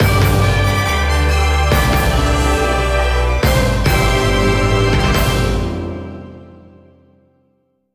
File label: Jingle Team Win